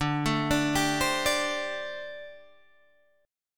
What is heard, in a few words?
D 7th Suspended 4th, down, Guitar, Open G